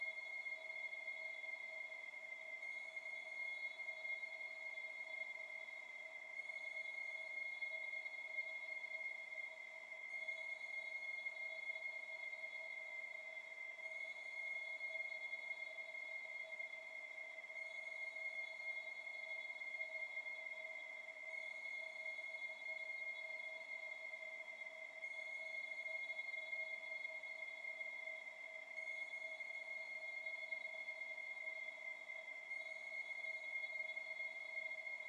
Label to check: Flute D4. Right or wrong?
Right